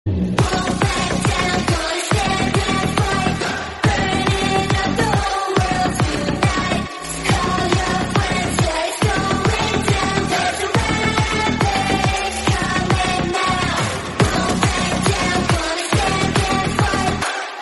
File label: Bgm Theme